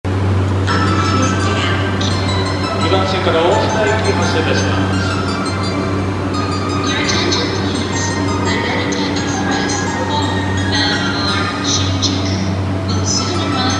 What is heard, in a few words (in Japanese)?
JR総武線快速東京西